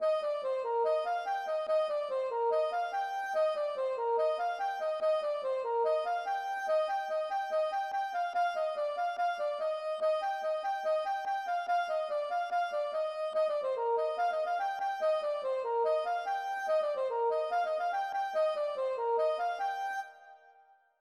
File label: plin polka; Plinn